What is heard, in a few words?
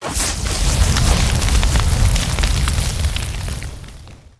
Flame Breath Swt; Evolve; Meteor Goliath